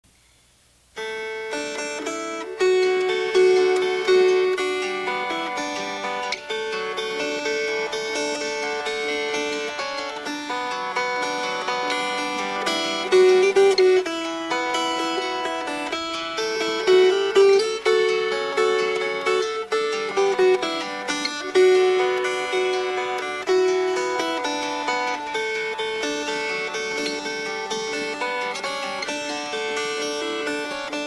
Water Is Wide, Fingerpicked

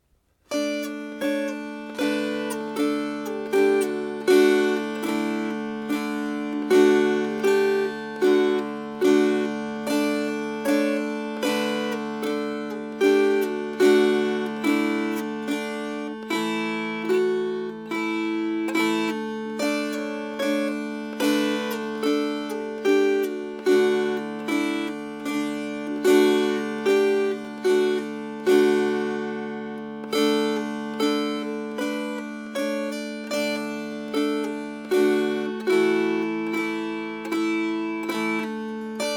Melody, Dulcimer

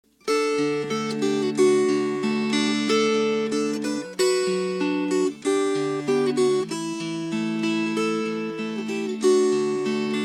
Fingerpicked

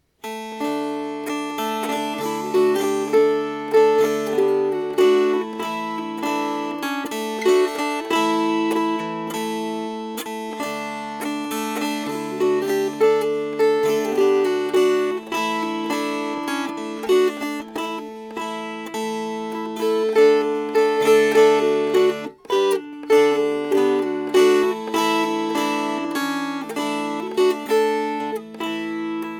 Strummed; Holmfirth Anthem

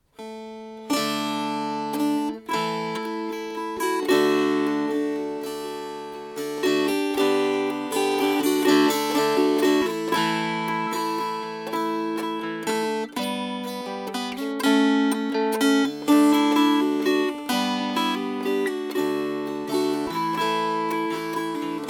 Plaisir D'amour Strummed

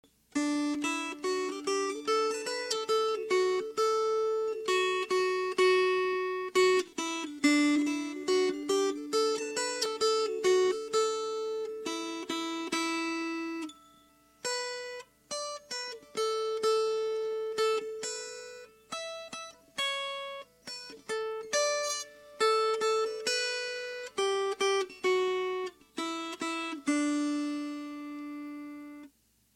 Over In The Meadow, Melody

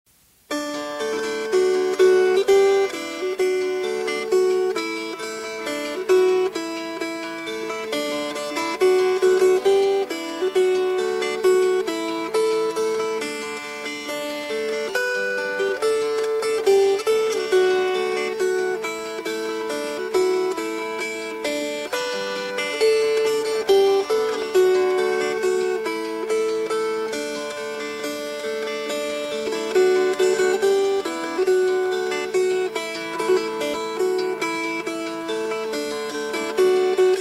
Fingerpicked; Donkey Riding

